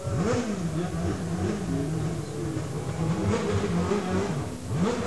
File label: AUTO, car sounds